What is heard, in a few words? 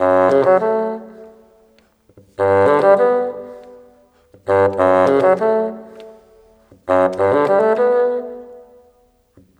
Bassoon, Orchestral, Rock Pop